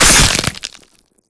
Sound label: hooked zombie rip; scripted sequences